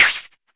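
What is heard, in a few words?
mbrute snare, Arturia MiniBrute; DRUM MACHINES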